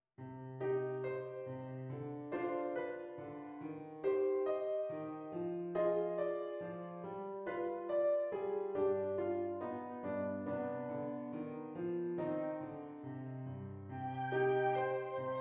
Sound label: Flute